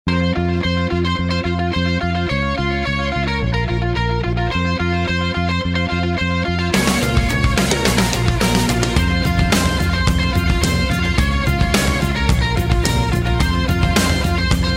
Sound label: Guitar Rock